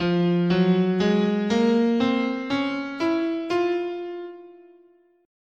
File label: F Neapolitan Minor, scale